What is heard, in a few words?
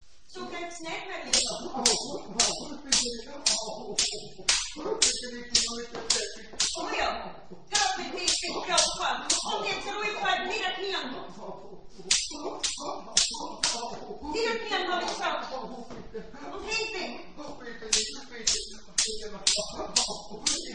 carpet beater